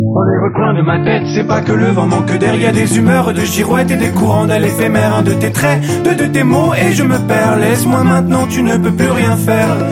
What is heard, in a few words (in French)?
passe bas progressif